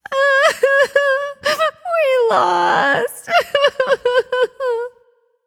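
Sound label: boohoo, female voice; smite; voicepack; hera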